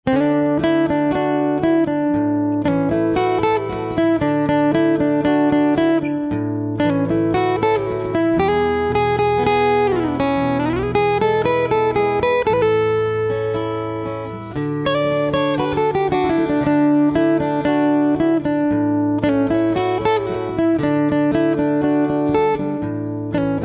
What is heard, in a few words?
Guitar